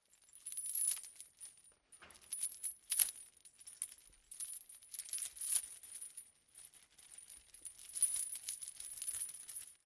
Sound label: Walking with chain